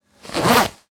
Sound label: Short foley